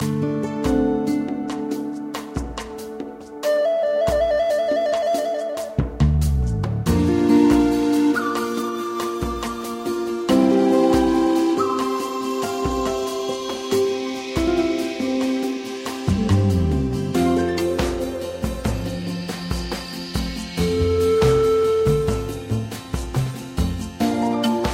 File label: music; Rescue Team 3